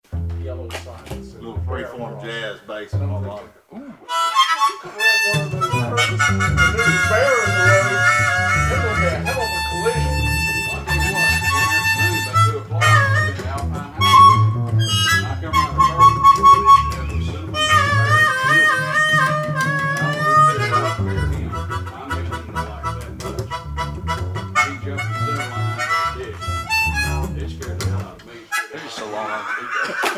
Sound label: Freeform Jazz With Bass and Harmonica